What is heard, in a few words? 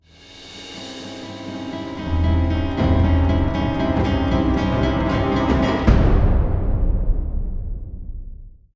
Lead In Cue; Quest Diorama; Warframe; Duviri; Cinematics